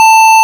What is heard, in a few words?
Reality Tv Censor Beep